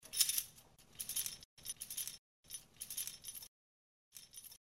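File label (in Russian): when the chandelier was swung once; Звук раскачивающейся люстры для монтажа, для создания атмосферы ужаса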